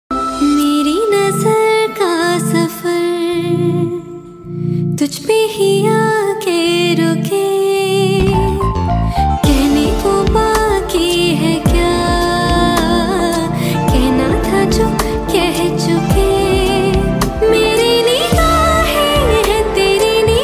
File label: Female Version Song Ringtone